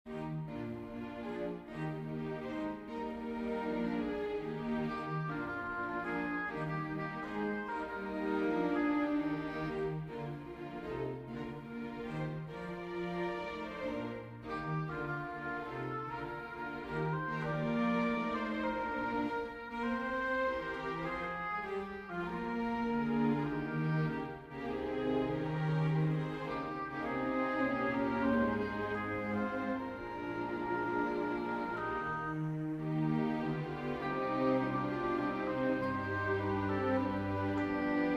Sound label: for oboe strings; Schumann, Robert; freisinn